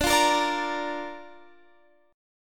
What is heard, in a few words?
D Minor Sharp 5th D Guitar Standard; x,17,15,15,15,18; Dm#5 strummed